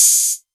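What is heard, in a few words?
808 Hat Open; Novation Drumstation V1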